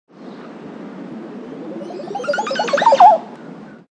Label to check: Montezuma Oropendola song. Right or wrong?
Right